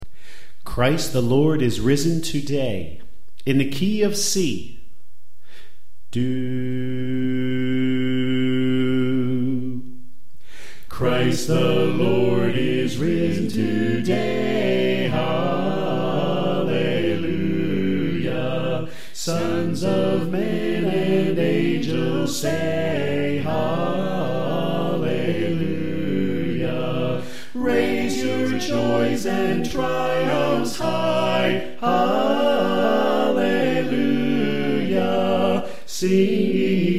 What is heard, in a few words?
Bass Left; Christ The Lord Is Risen Today